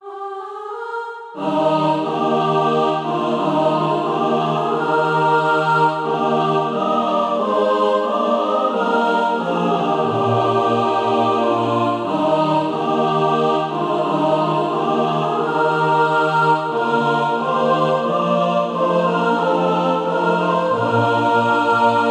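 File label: mixed, Practise Music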